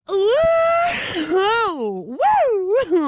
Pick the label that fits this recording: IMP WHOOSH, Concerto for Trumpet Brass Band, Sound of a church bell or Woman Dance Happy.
Woman Dance Happy